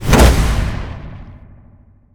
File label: tower projectile; damage; player; physics